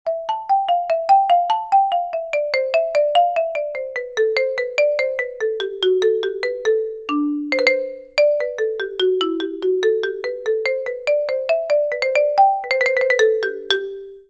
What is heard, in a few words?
marimba